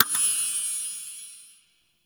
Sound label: Boon Rollover, UI; Duviri